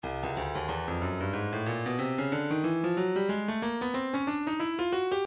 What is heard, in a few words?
breakbeat midi files 092 093 bpm; genuine b; Stylus Breakbeat Midi Files